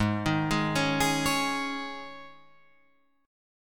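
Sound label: Ab Suspended 4th chord, Guitar, Drop D tuning; down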